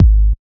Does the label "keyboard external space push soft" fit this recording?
No